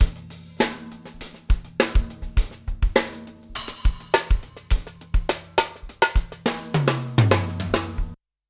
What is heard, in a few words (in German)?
effekte drums eq; ips 15; ccir; oxide; nr off